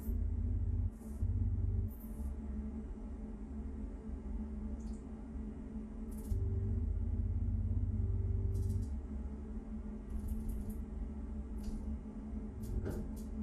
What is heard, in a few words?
bt interior cockpit; Titanfall 2